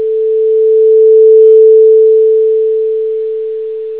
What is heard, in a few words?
sin 2